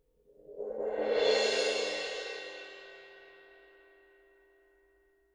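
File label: suspended cymbal roll; パーカッション; サンプリング素材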